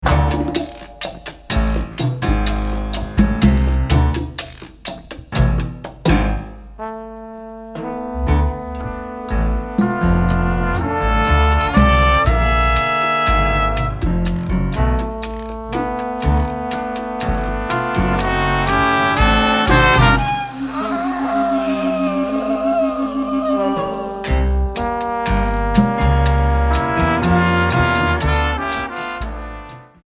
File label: Jazzz